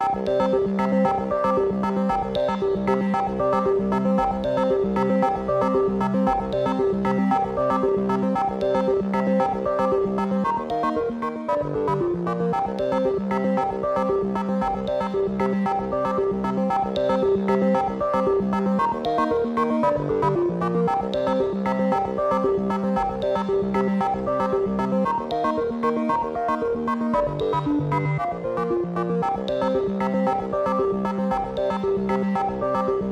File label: Digital Pirate